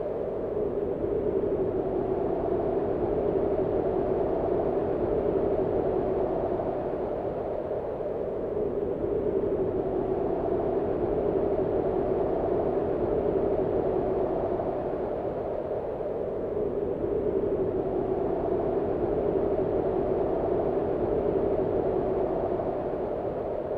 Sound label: wind; megaglest